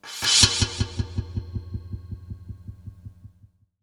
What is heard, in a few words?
Delayed, Wire & Tin, contact mic; musicradar; contact mic madness